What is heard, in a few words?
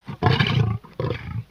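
Pets Triceratops Happy; creatures; Lego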